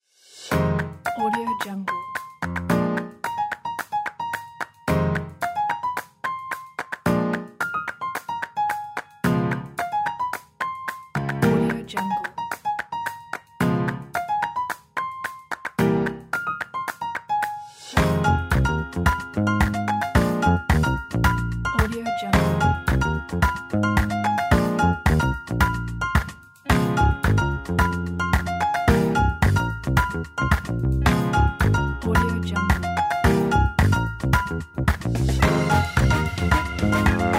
upbeat jazz; delightmusicstudio

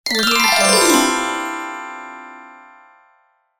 sparkling chime transition

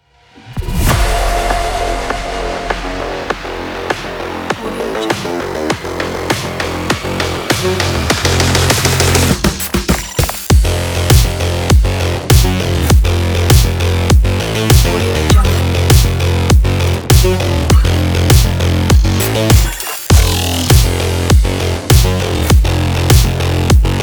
cyberpunk; stereo jam

